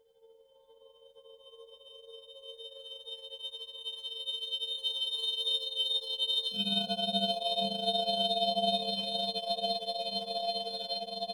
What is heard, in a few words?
mood (creepy)